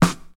damped steel snare drum f key, snare tuned to the F note; snare drum samples